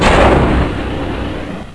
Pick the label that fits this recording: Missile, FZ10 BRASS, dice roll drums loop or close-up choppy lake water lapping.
Missile